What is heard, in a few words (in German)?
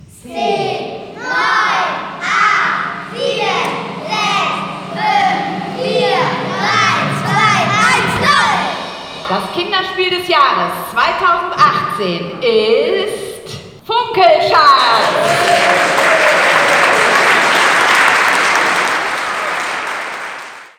countdown